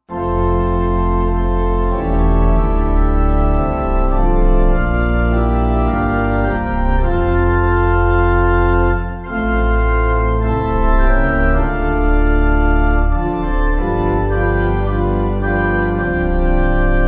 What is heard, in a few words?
Pipe